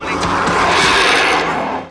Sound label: pain; quake2; tourney